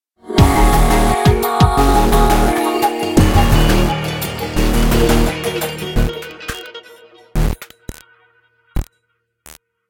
Fight Low Combo; Remember Me

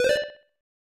Coin; Hisshou 777 Fighter II; SNES

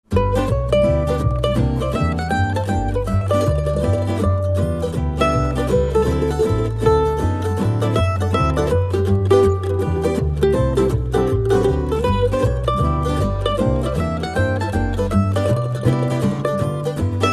Roundhouse Music; Sid Meier's Railroads

